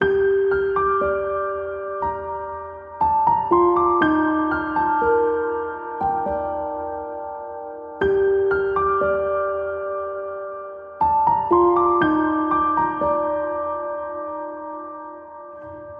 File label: LO-FI PIANO Melody, Gm, 60bpm; LO-FI PIANO VOL.1